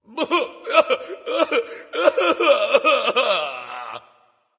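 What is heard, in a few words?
nonverbal boohoo; smite; voicepack; ullr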